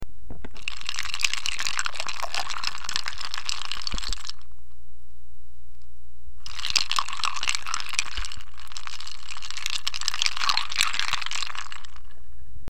iced Tea

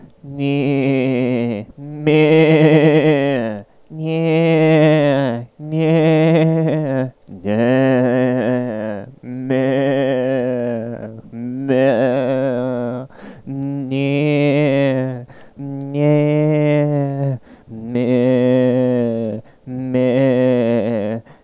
bleating

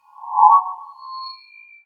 alien scanner

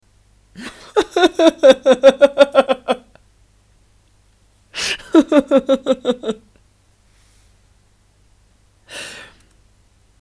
laughter

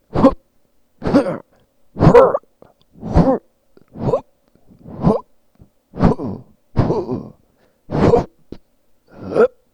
male jumps

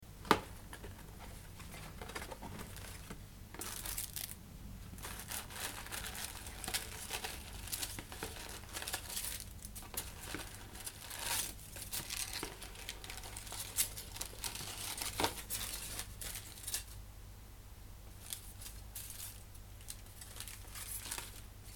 opening box of chocs

